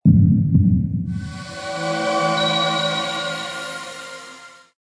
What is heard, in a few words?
box get ui show; croods